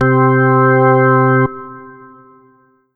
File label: Korg 01W CX-3 C3